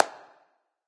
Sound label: clap; smite; chronos